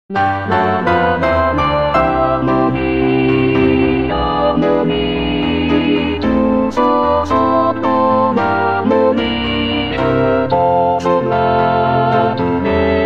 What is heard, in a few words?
harmony